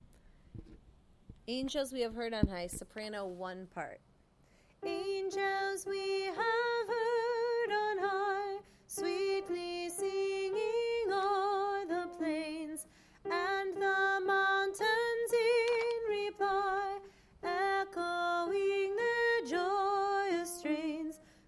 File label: Angels Soprano